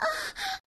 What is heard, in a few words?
Moan